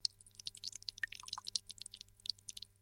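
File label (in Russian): вязкую сладость выливаем медленная струя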